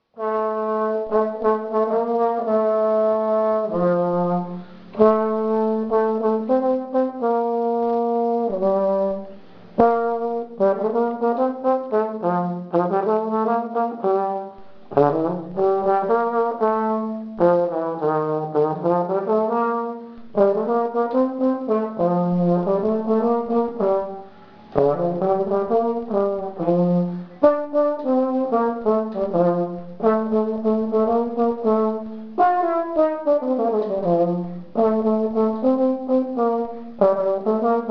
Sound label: Trombone